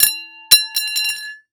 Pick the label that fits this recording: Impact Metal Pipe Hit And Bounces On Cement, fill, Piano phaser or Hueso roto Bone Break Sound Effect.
Impact Metal Pipe Hit And Bounces On Cement